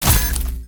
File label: bat release, ambience